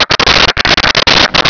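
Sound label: voice droid; pdroid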